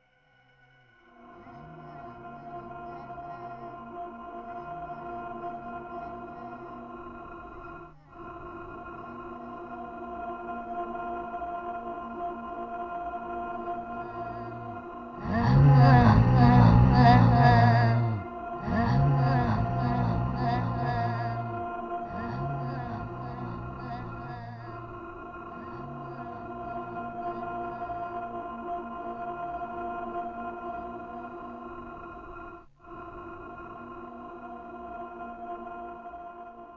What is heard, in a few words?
evasion, music